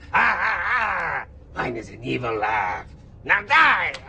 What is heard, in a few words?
evil Laugh